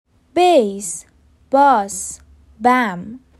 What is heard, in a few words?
Bass